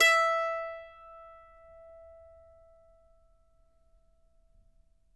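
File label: mando notes E open; Sampleheads; Whole Lotta Country 1 & 2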